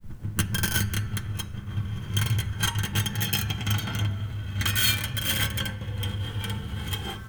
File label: String Scrape, Piano; Piano Miscellaneous